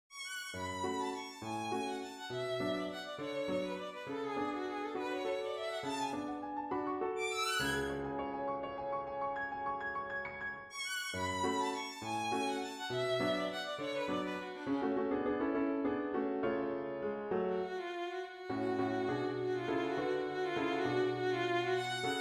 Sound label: impromptu for violin